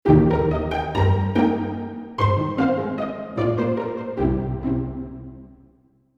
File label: Full Pizzicato; PC3